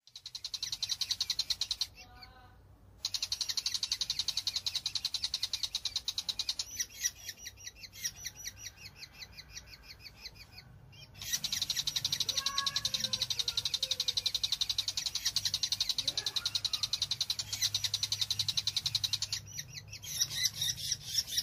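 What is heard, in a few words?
bull-headed shrike, oyama; mozu; koe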